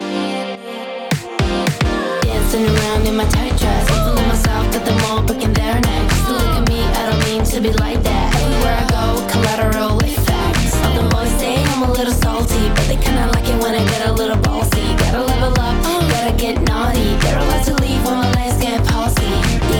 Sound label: clipper off; neutron 5